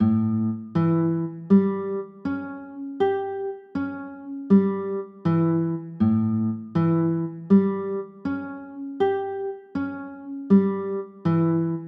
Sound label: a 6, chords